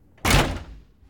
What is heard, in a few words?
door close; Golden Crayon Sounds